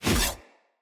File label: Axe Whoosh Heavy; Weapons; Enemy; Axes; Glades Axe